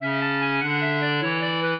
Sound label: minuet, clarinet; Mozart